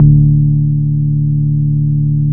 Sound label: BAS, Bass Analog & Synth; USB Soundscan vol.12; AKAI